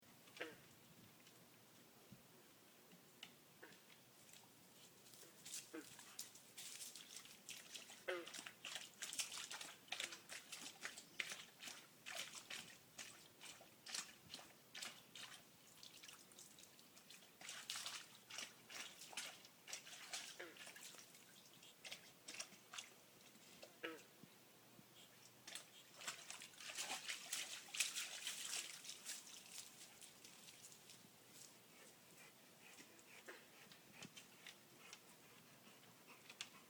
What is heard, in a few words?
frog chorus